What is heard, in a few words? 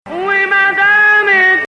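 Hijazkar Octav, Arabic music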